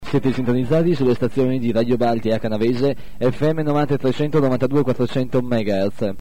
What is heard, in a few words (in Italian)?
stacco